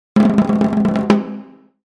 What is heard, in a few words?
drum roll hit